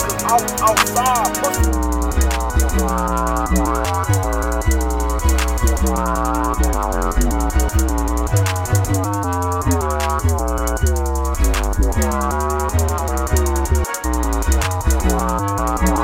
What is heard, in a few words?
bass